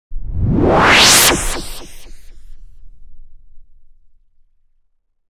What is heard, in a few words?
teleportation; 攻撃